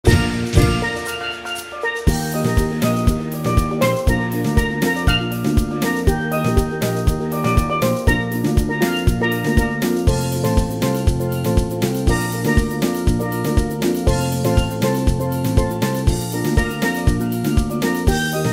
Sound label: steel drum